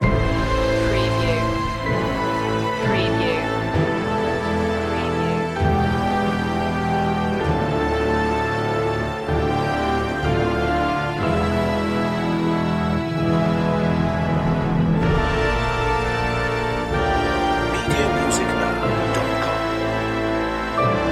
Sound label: the death of a hero no choir